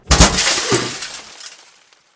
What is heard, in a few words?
crash; Ludum Dare 46